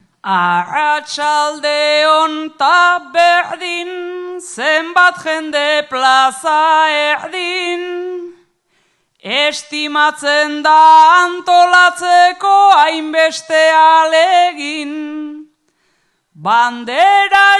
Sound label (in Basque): agurra, bertsoa; larraul